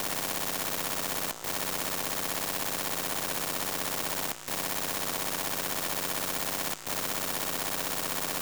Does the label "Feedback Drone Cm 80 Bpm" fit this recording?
No